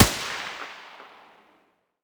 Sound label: muzzle M249 mono distant